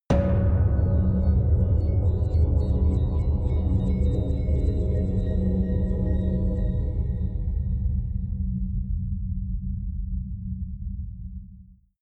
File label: atmospheric artifacts long, impacts; hits explosionen